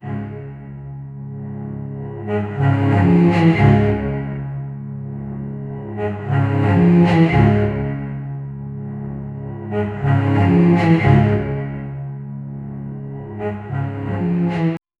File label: low cello swells and bows